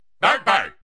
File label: bark bark alert ringtone